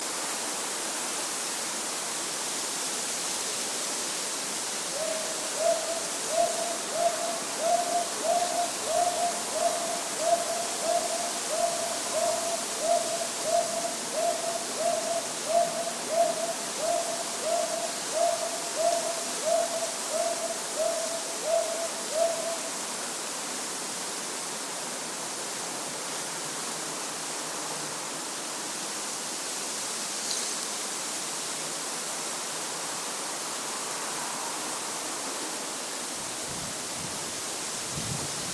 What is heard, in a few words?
hawk owl; abiko